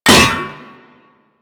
Sabotage Cell Drop; Warframe; Missions

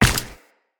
Run Ice, Footsteps; Tenno

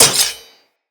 Dagger Metal Impact; Weapons; Dual Daggers; Hit Effects

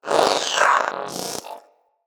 Companion Vocal Breath Walk, Pets; Zanuka

